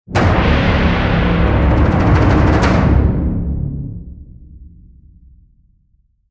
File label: stinger